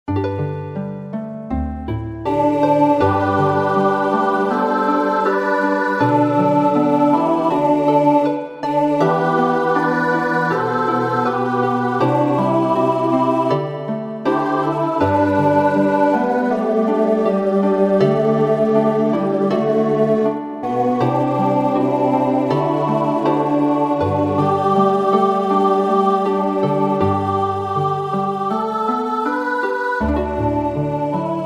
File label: Auld Lang Syne